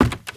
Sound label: STEPS Wood Creaky Run; UltimateFishing2020